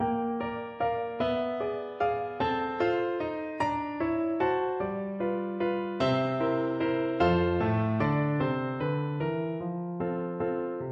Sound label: sweetheart, Flute